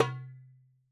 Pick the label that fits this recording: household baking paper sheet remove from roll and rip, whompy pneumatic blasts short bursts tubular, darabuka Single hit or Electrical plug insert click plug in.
darabuka Single hit